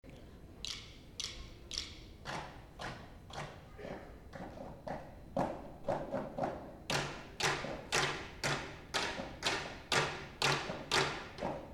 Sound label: Percussion